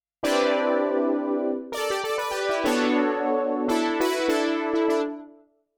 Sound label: Virus TI2 Polar